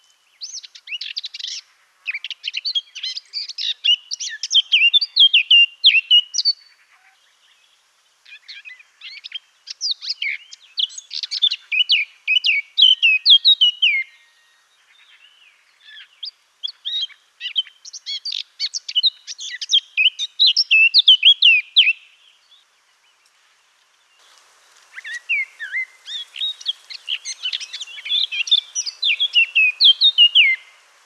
call, Blackcap, ends with a typical Blackcap song! Background: Great crested greebe and Magpie calls.; sylvia atricapilla